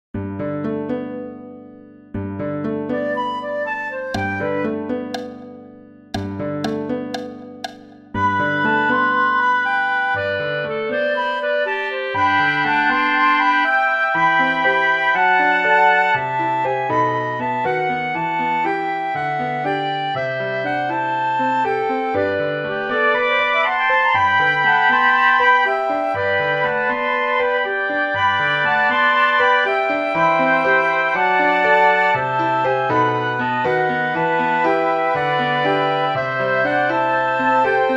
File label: Flute sopraan; Flierefluiter 6